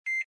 Household Button Beep Microwave

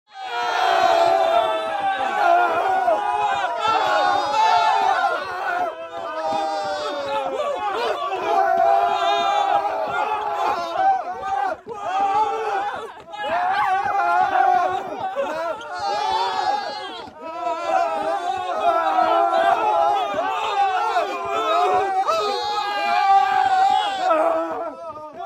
Human Crowd Footsteps Running And Screaming

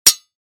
Weapon Sword Hit Metal Strong

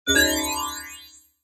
Multimedia Internet CD Rom Flash Beep Evolver

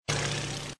fire engine

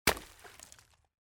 Liquid Water And Ice Impact

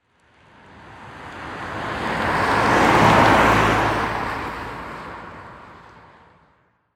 Transportation Cars Honda Civic Drive By Slow

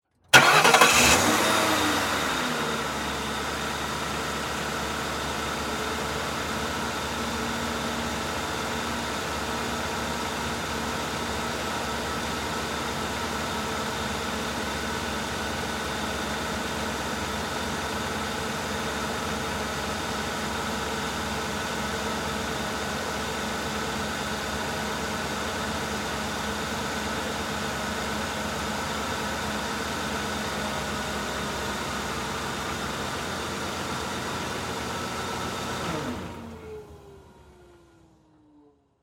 Transportation Auto Police Car Start Idle Off

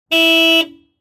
Transportation Cars Honda Civic Honk Horn Medium

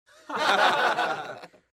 Human Crowd Laugh Short

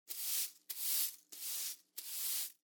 Household Broom Sweep Multiple

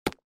Sports Bag Punching Bag Hit Single

